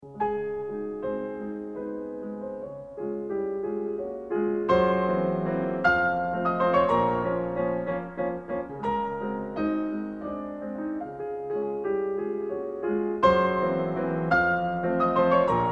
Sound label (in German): Carnaval für Klavier; Wilhelm Kempff; Op 9; 19 Promenade